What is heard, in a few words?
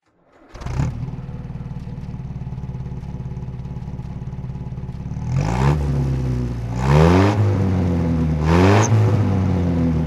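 austin healey 100 M throttle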